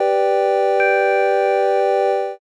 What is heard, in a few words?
church